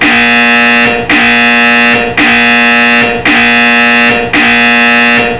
buzzer loud; Apache; Kirvin; Optional JK Customizations

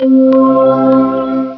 Phone On; Titan

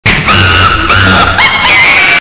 Shutter Sound, Camera; Raphael

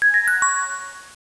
Chime in; Apache; Slide Sounds

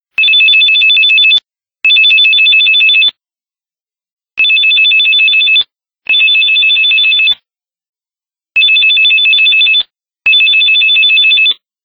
Roam Ringer; Titan

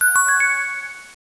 Chime out; Apache; Slide Sounds

